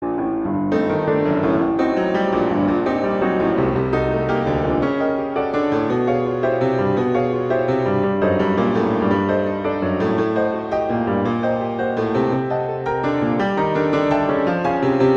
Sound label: in G minor, Molto agitato; Chopin; Preludes